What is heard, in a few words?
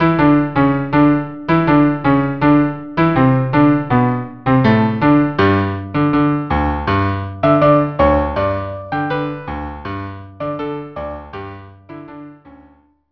Tango violin cello